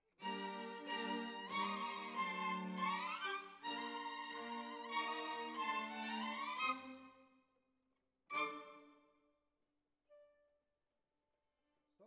Violins Over

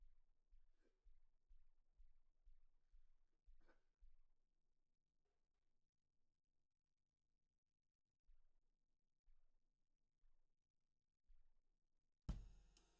Snare Bot

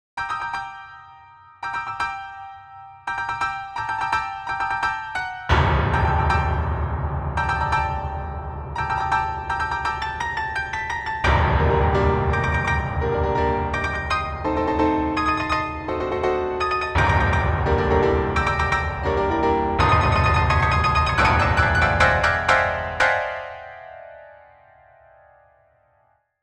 Mini Grand, piano

